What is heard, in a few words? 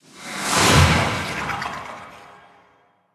Elves Elite harassers Last breath